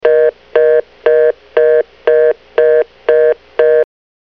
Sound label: BUSY SIGN, alarms